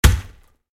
snow man attack contact

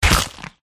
dog upg attack contact up

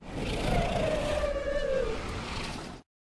Rat stun

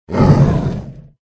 dog demon buff

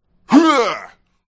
bot human male attack voice middle

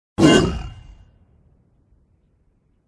tiger block

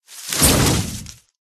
flower

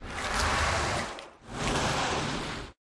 Rat stun

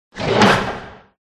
boss kalmar attack voice bottom

